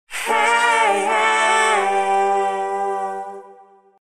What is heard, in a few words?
melo chorus; 出来あがり; Melodyne; ここで行ったのはピッチ変換の他に、タイムシフト・ストレッチ、フォルマントです。